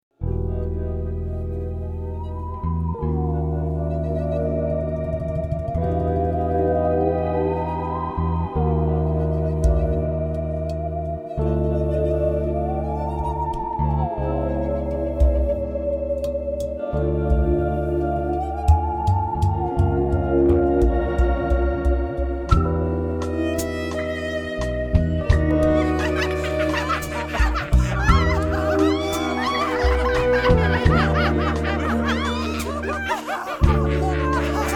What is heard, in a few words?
cackle